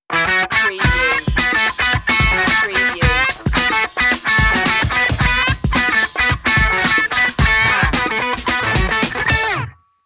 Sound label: funky sting